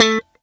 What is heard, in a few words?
a4 staccato, glowly bass